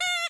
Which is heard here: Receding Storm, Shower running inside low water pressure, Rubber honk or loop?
Rubber honk